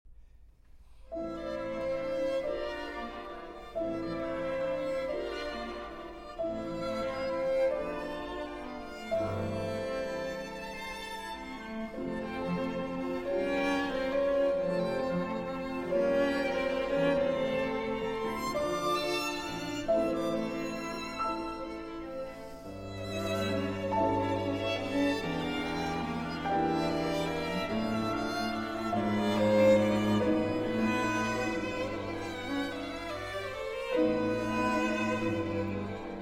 Concerto for Piano, Violin and String Quartet in D Major; Chausson; Op. 21 II. Sicilienne